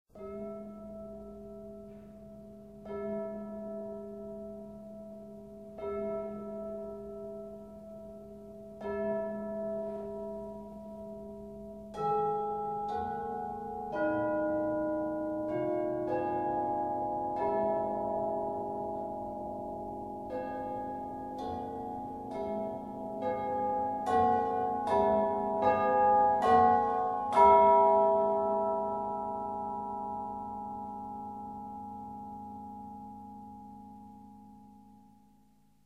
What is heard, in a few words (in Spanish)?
Campanas tubulares